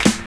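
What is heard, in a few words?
SD, snare drum; X-Static Goldmine 1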